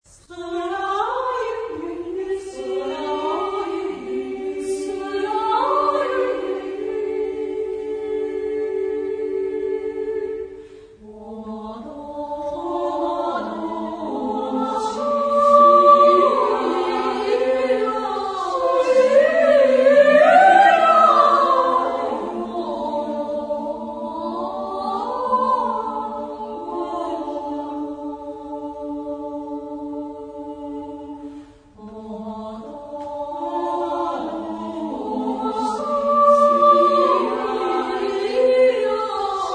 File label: female; Fukushima; Nantoo uta asobi; Hashi; chorus